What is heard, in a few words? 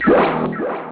click sound